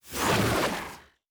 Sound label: Synth Whoosh, Sci-Fi Sounds Movement